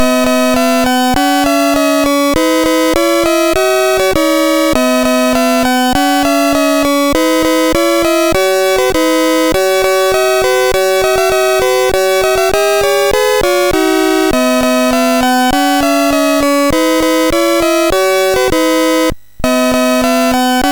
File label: Dragons Den, MOS6581R3